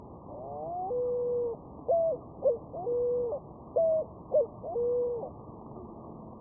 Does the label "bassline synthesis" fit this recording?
No